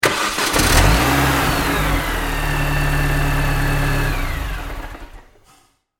transportation car start off external garage mini cooper bmw; Car Start Then Engine Off - Recorded Externally Of Car In Medium Sized Garage - Mini Coope; Car Start Then Switch Off; Vehicles; McKinneySound